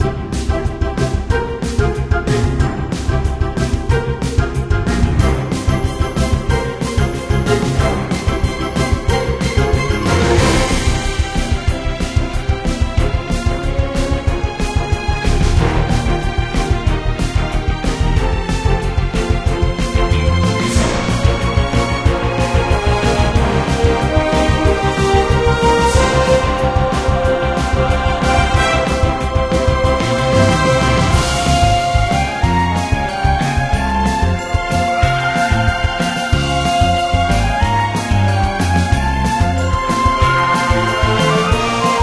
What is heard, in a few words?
trainer battle music; EvoCreo; Android game music